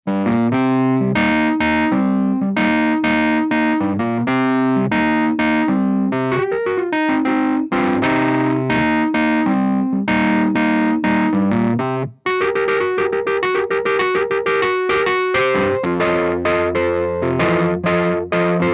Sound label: Overdrive Twin, electric piano